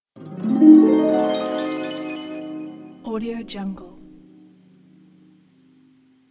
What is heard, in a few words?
magic harp